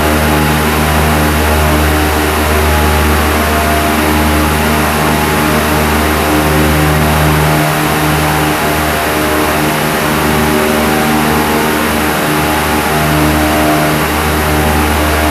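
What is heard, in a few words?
ambience industrial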